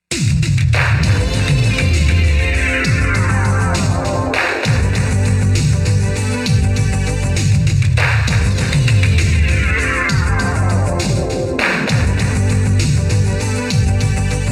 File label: SUCCESS; data wing; android ios